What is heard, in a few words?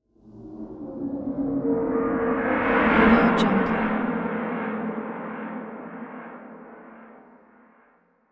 creepy game transition musical dark atmospheric swipe fall